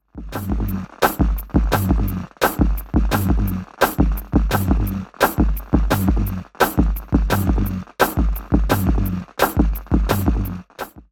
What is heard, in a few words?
Wires Mixed Lo Fi; AudioThing; Hainbach; Bundle